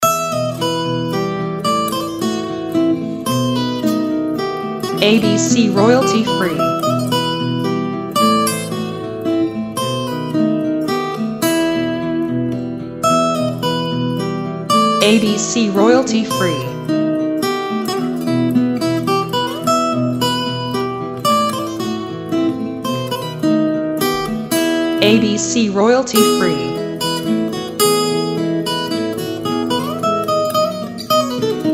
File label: Adelita acoustic